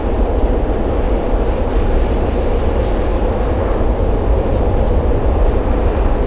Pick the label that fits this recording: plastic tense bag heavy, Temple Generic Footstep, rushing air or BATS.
rushing air